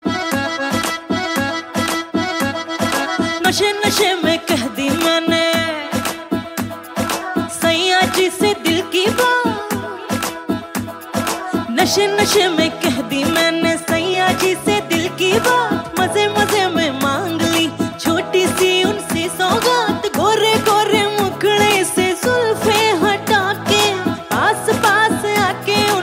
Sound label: song tone ringtone, bollywood ringtones